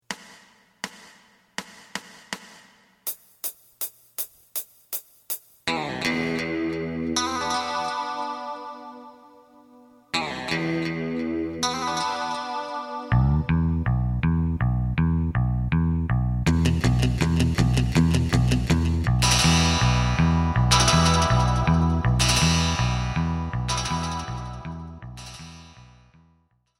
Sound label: drum; エレキバンド用カラオケCD